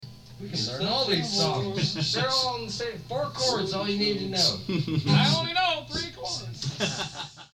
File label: 4 Chords